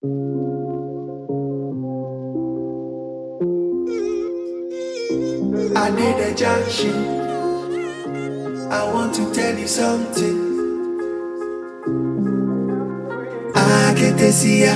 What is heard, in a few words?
Shaker; Aketesia